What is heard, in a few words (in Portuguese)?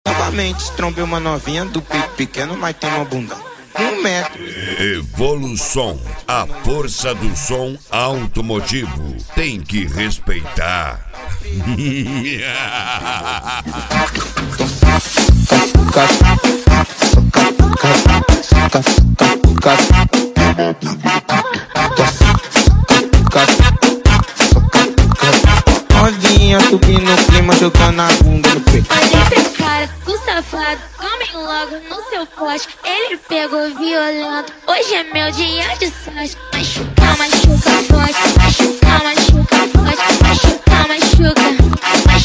noia dance